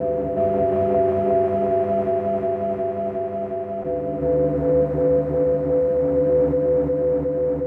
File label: Pad Loop 125, Loungin House; Music-Fx Loops 125; Equipped Music